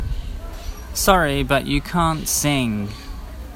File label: Can't Sing